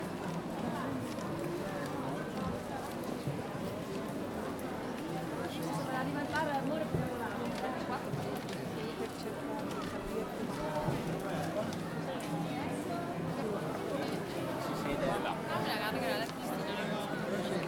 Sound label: Crowd Passing By